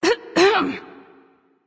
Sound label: clear throat; smite; artemis